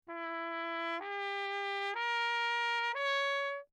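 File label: trumpet mute Lechner; Perinétventiltrompete; AMR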